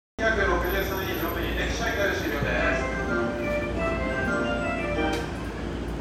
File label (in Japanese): JR武蔵野線新松戸東